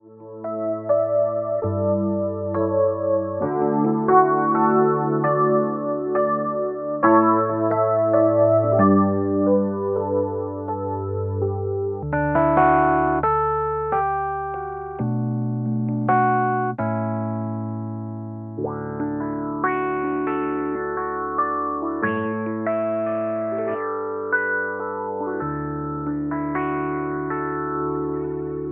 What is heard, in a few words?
K2661 E-Piano